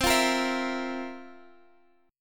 F Major 7th Suspended 4th C

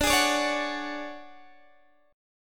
D Major 7th Flat 5th Guitar DADGAD, chord

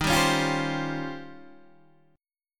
D sharp 13th, Guitar, DADGAD

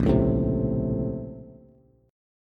A sharp Minor Major 9th, down, Bass

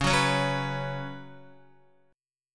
Db 7th Suspended 4th Guitar; 4F,4A,5C,5G,5C,6E; strummed; chord shape; x,4,6,6,6,7; JGuitar

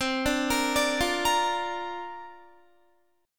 C 7th Suspended 2nd Suspended 4th, Guitar; C7sus2sus4 arpeggiated

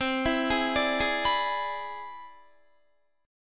C Augmented Major 9th C down Guitar; arpeggiated; 4F,4A,5C,5G,5C,6E; chord; JGuitar

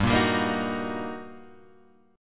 G Minor Major 7th Sharp 5th G, Guitar Open G; chordshape; down

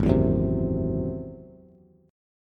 Ab Augmented 7th Ab down Bass; Ab+7 strummed; JGuitar

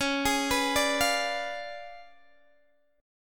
C sharp 7th Flat 9th Guitar Open G, chord

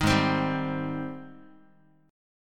Gsharp Major 7th, Guitar; strummed; 6,4,3,5,x,x; 4A,4E,5A,5D,5G#,6C#; G#M7

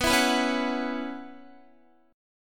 B Suspended 2nd Suspended 4th, Guitar; Bsus2sus4; strummed; x,14,14,16,13,13

